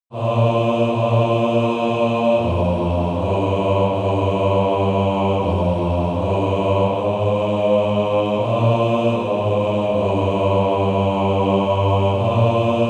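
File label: Male Choir, bass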